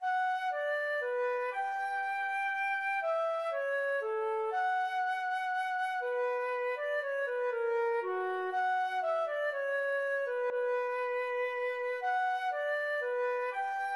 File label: menuet, feadóg; krieger